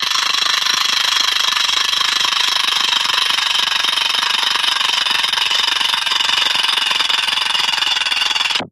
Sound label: Ratchet Slow Wind, ratchets